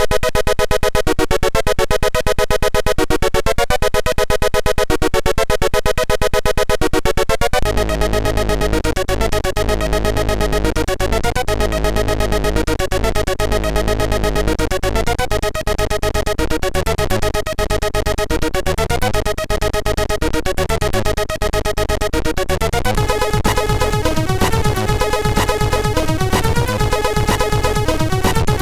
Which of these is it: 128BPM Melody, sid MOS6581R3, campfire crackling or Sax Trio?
sid MOS6581R3